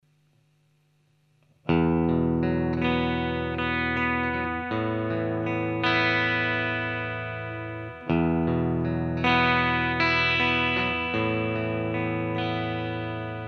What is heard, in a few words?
boost